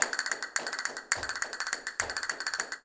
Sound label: Castanets, Flamenco